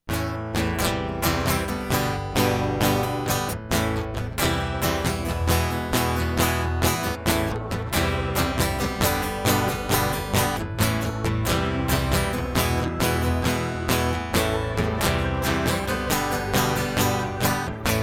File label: Instrumental